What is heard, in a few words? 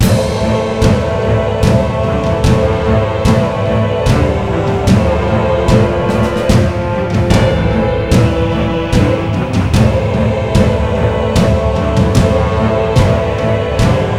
The Martial March - Short Loop; Midas Gold Plus